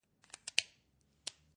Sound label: Pen Cap Off On, Remove cap and replace cap on plastic pen.; Pen Sound Effects; Offices; Lynne Music